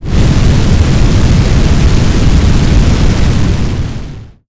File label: fire; magic